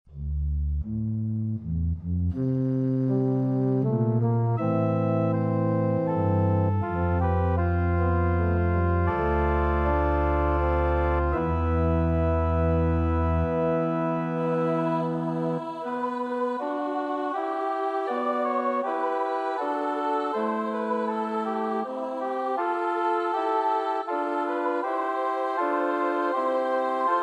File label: soprano nunc dimittis sibelius midi audio; Robert Oganovic